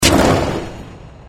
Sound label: ui count; disneyxd; stuntastic